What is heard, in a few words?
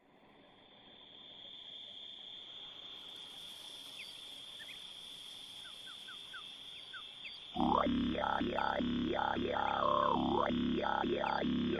hip hop